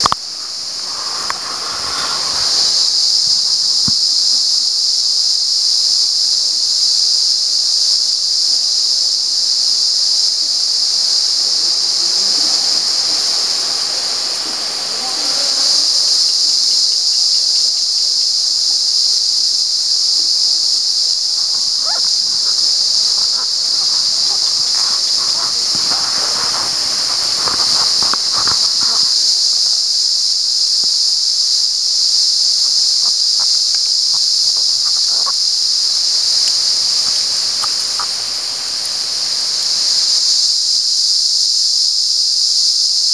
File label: cicada, san ramon; サンラモン